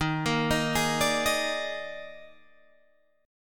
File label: Eb Major 7th Suspended 4th Eb, Guitar, Open G